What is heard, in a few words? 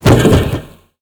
bodyfall tin roof